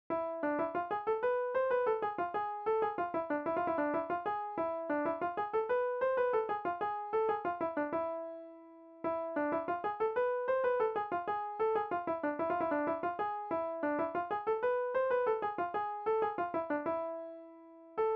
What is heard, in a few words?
melody